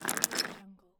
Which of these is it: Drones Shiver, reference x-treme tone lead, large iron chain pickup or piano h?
large iron chain pickup